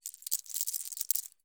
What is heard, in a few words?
Apparel, bracelet, silver, ceramic, glass, leather, adjusting, clamorous; Vaeyan II